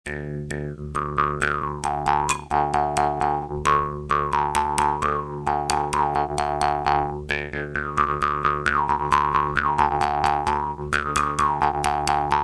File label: Susap